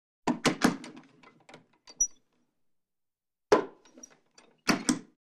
The freezer door of the refrigerator is opened, closed